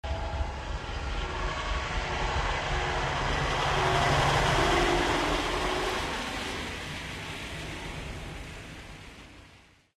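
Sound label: car one shot, harbor; Source Engine; tf; cp harbor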